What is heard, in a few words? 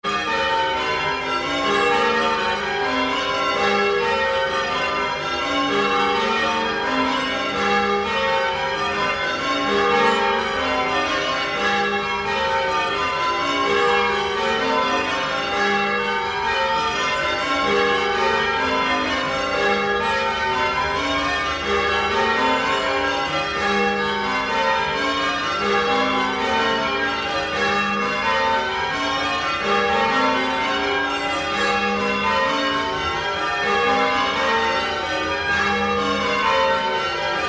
Stedman Triples